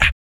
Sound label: VOC PERC, Vocal Planet Jazz FX BASS PERC